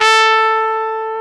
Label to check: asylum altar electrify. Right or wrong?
Wrong